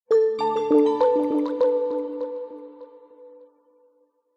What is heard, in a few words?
multimedia alert dreamy soft warm drippy delayed bells positive